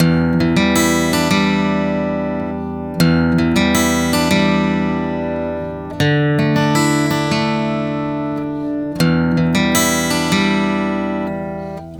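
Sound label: Acoustic Arpeggio, Acoustic Guitar; Sonic Foundry (Sony Creative Software) - Continental Drift Aftershocks; Native American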